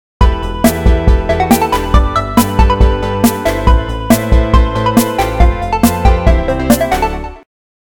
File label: chords